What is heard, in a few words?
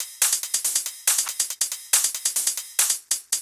drum Loop 140BPM HH; Vengeance - Essential Clubsounds Vol.5; VEC5 Drumloops; VEC5 Multi Loops 140BPM